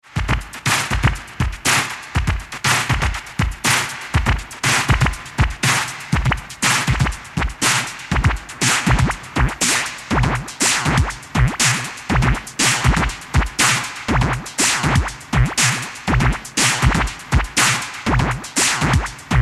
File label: Drum Electronic Sound